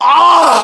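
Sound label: death, male; english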